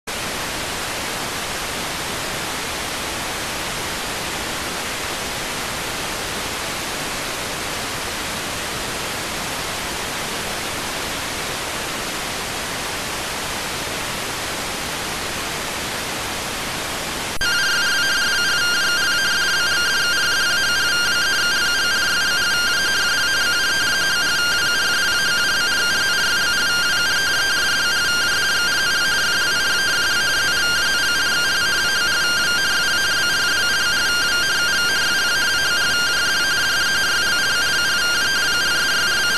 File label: rtty rx